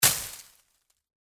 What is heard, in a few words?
obstacle hit leaf